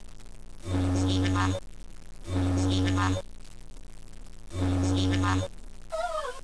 Egg Tray

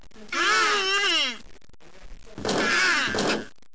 Angry Honker

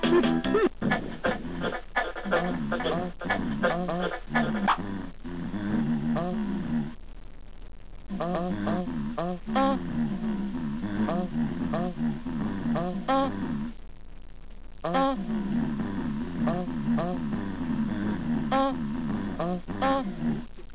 Singing Skorch

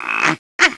Funny Honker

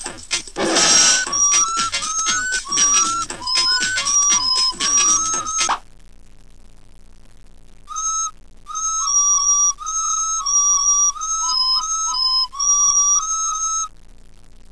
Singing Jester

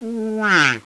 Honker Waaa

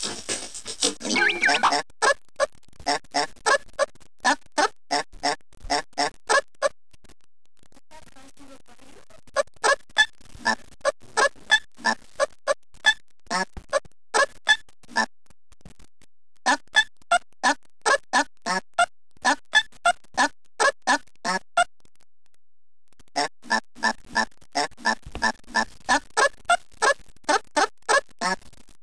Singing Lips

